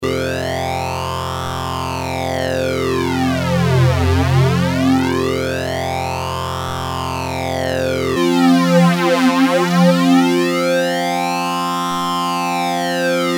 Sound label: prodigy ibanez fl99